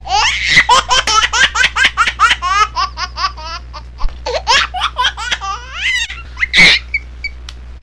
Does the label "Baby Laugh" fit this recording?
Yes